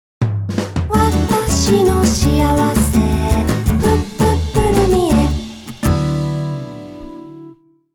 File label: logo